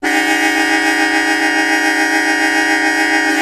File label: Car Horn Tremolo, american